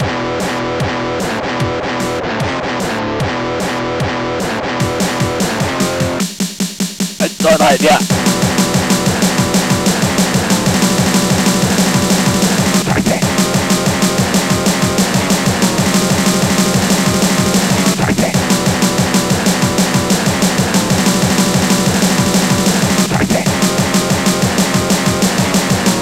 ALOHA

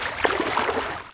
stroke; Shadow Man

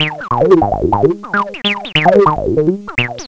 nice loops; 303tracker

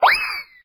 Fwing; Hamsterball

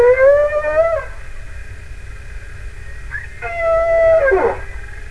WHALE

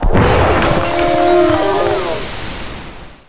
howl die; duke nukem total meltdown

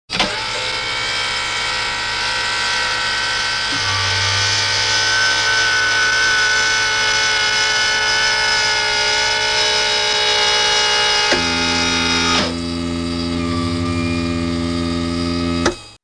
Servo motor; Roman

